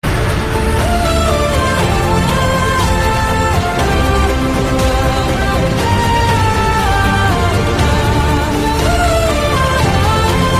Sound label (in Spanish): MUSICA EPICA; THEGREFG; Memes